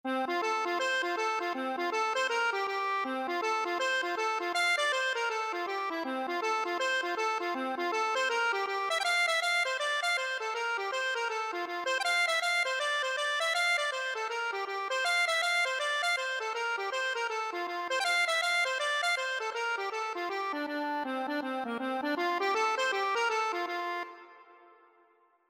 accordion

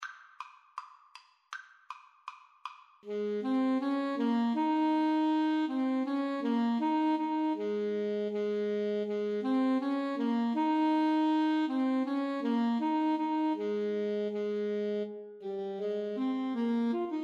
Alto Saxophone Duet

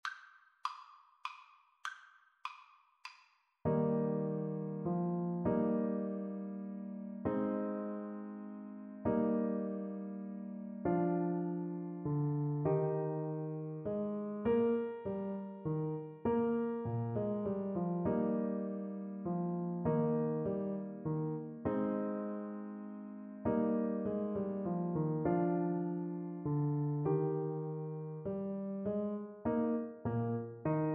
minuet, Clarinet; bach

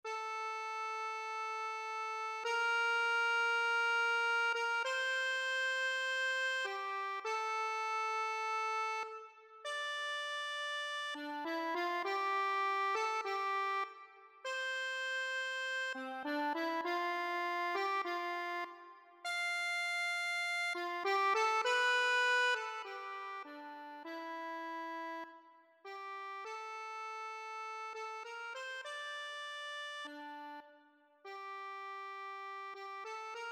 ave maria, accordion; gounod

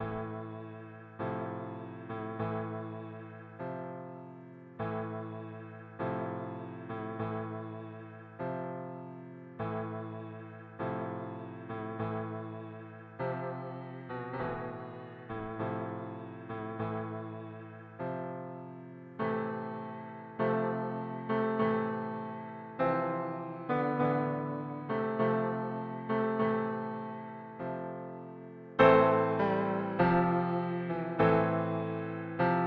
chopin funeral march, Double Bass

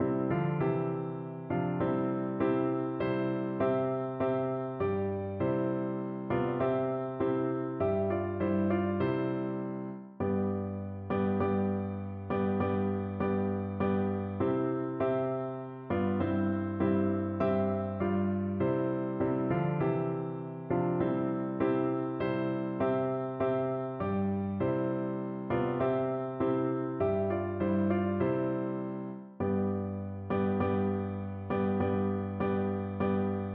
organ

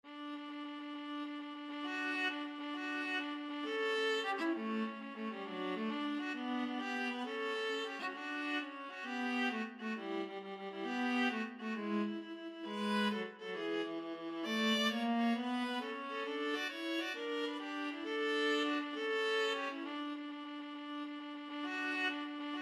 VLA, viola duet; mendelssohn italian

